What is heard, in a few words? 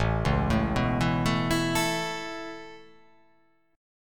A Major Add 9th A, chord